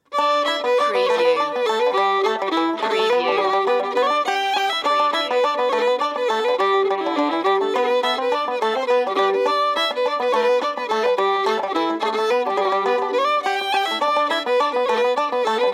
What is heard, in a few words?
banjo and fiddle